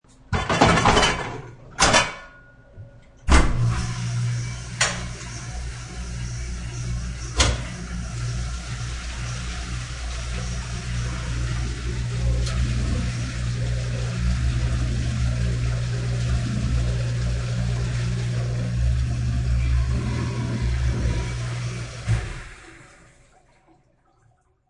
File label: Restaurant dishwasher runs; Industrial Dishwasher; Kitchen; Household